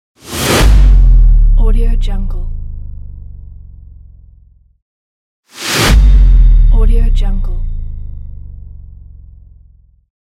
sub bass drop

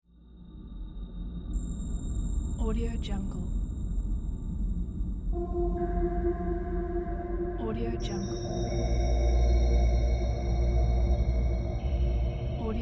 horror atmosphere

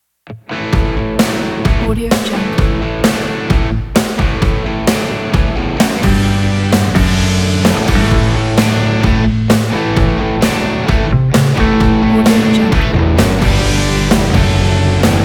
country rock

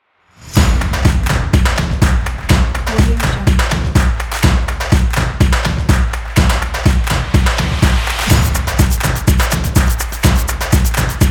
drums

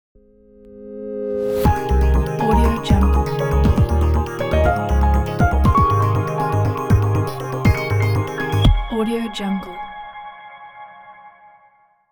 classic logo opener

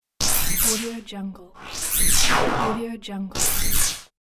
retina laser scan; atikus-studio

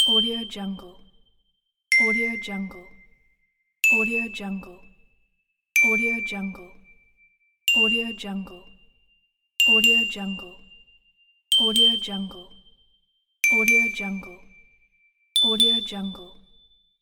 ding

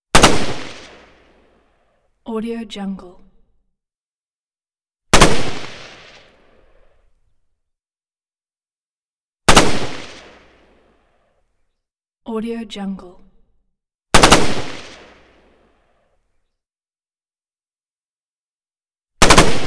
assault rifle; prosoundfx

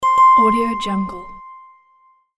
right answer ding ding

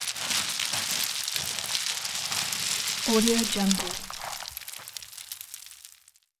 stones fine debris; soundbits-soundfx

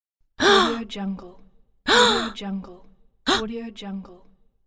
woman surprised gasps

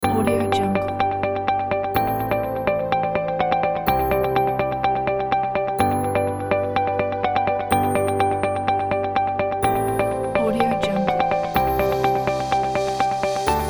background inspirational uplifting pack

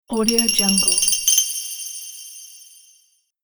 sparkling cave magic

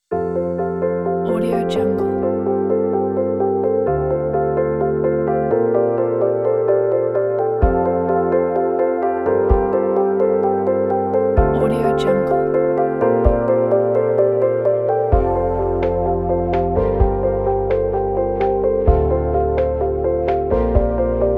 uplifting piano

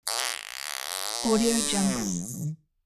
whoopee cushion

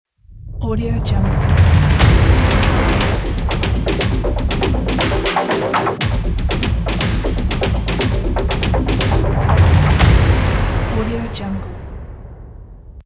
epic percussive cinematic trailer intro

